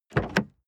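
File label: sports car exterior door open medium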